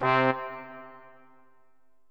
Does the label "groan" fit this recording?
No